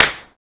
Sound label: Synth Snare